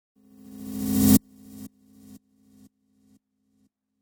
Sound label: Digital feedback transition with delay repeat fade